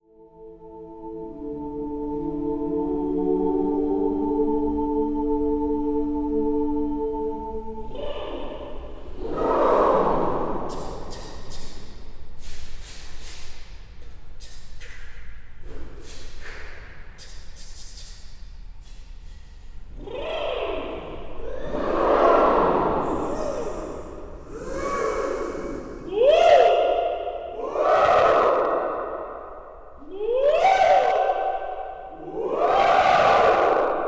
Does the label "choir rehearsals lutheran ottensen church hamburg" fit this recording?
Yes